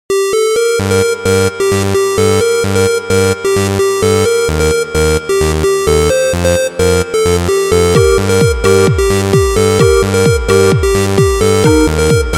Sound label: Dance Electro Party